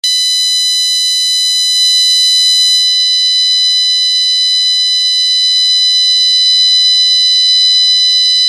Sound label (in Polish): buzzer bez generatora piezo 3v